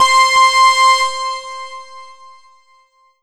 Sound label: Ensoniq VFX-SD Synth Lead C5